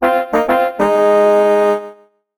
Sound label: start; skywars